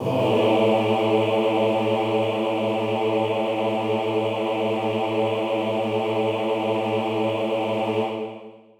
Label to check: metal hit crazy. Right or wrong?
Wrong